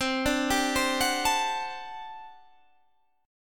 G Major 7th Suspended 2nd Suspended 4th C

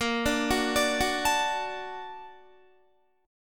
A sharp Augmented Major 7th A sharp Guitar; A#+M7 arpeggiated; JGuitar

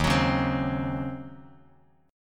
D sharp Minor 13th D sharp Bass Standard, chord; strummed; JGuitar

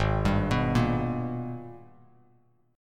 Ab Minor Major 9th, Bass; AbmM9; arpeggiated

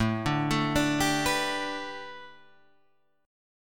A 9th Suspended 4th, Guitar Open G

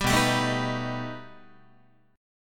B Augmented 7th B down Guitar; B+7; strummed; 4A,4E,5A,5D,5G#,6C#; 10,7,10,7,7,10; JGuitar

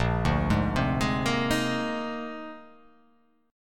A sharp 11th

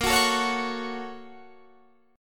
Bb Minor Major 7th Sharp 5th, Guitar, Standard; BbmM7#5; strummed; 18,21,19,18,19,21; JGuitar

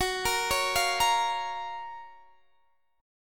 F sharp Major 7th; F#M7 Chord; arpeggiated; x,21,20,18,18,18

